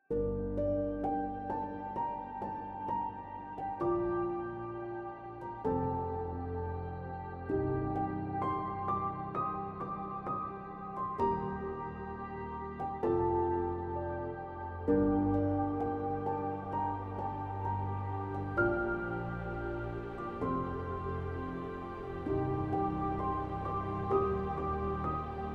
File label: Atmospheric Piano With Atmospheres